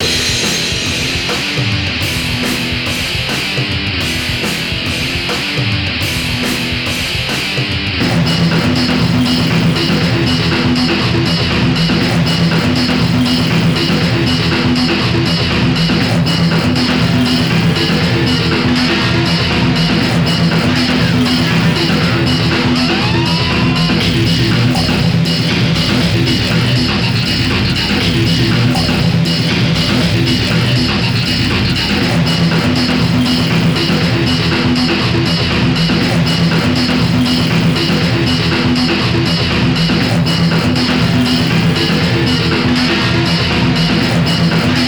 trance; Sound of the Human Tanks I

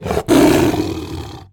roar

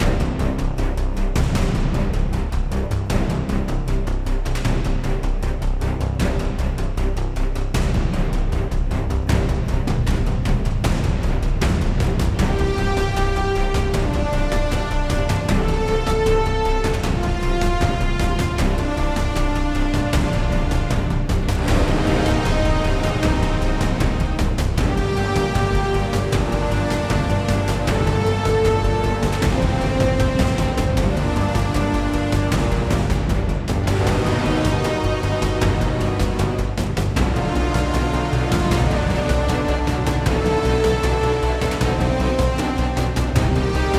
Music Menu; Final Space The Rescue; vr gamerip 2022; Holdout

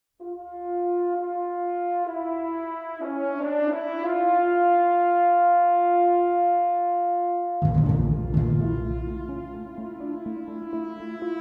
String; Assassin's Creed Unity

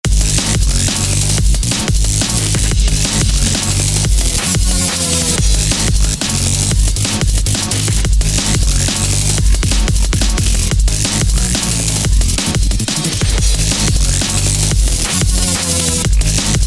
Drum and Chase; The Henry Stickmin Collection

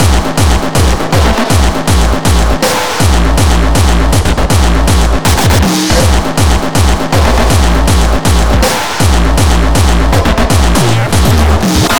Beats Loop

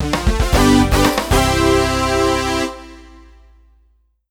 Golf - Par; Super Monkey Ball 2

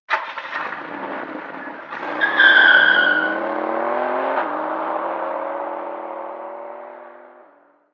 Car Drives Away

